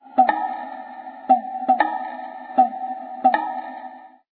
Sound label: instrument tsutsumi